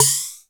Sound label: perc mod puk